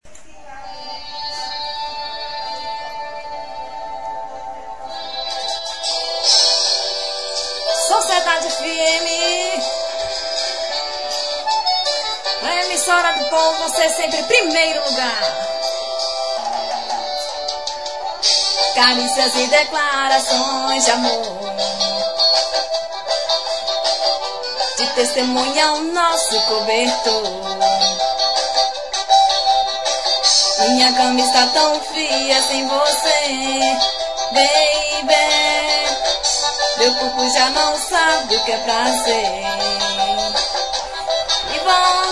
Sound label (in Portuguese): locutora cantando